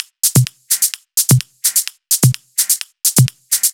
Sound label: Loop, 128 BPM